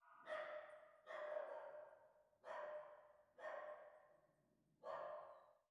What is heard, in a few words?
ambient battle; World Of Warships